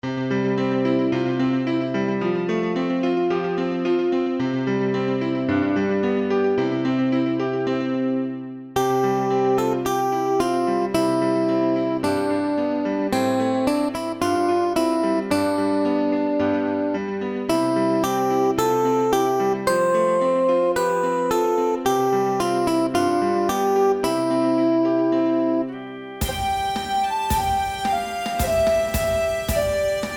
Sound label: Karaoke